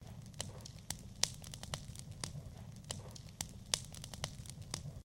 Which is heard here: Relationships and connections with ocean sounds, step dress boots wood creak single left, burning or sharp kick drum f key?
burning